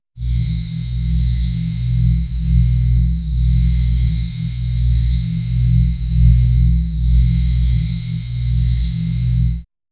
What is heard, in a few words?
vein pulse throb deep